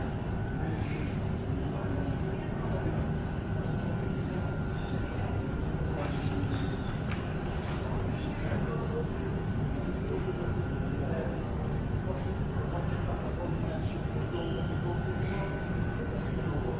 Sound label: ambiance, Metro